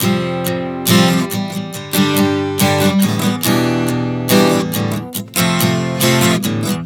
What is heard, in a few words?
Prog 070 F-G-Em-F, Acoustic Guitar, Chord Progressions 070 bpm; Sonic Foundry; Songwriter Acoustic Guitar Companion